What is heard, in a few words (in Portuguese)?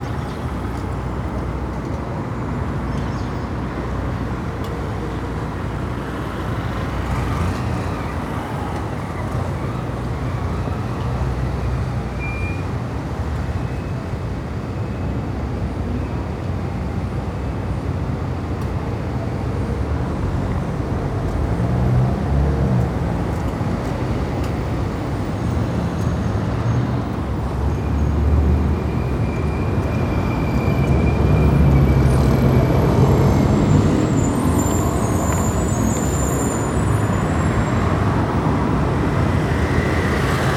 Centro de goiania inicio da tarde muito transito com passaros; movimentado